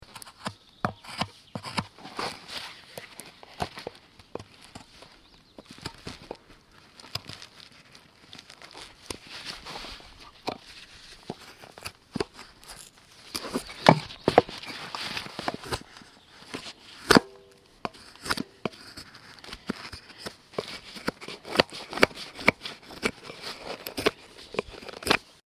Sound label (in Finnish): puun vuoleminen